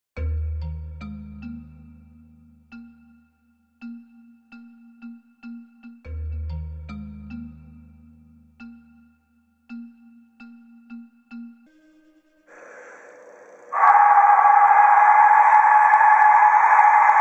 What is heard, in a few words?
Aztec Death Whistle; سوت مرگ آزتک ها